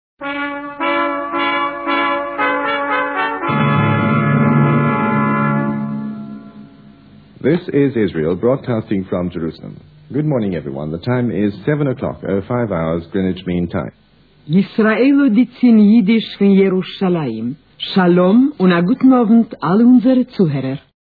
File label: interval signal; voice of israel c1976